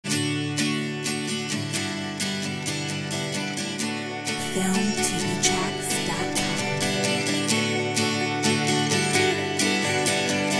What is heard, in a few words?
carefree happy